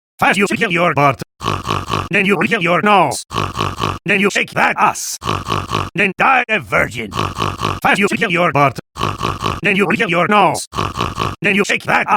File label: SNORT SNORT SNORT (Acapella)